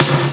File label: SNAR; drumware